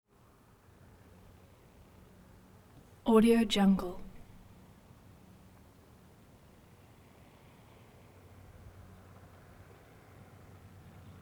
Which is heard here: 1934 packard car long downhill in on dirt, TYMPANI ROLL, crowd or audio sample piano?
1934 packard car long downhill in on dirt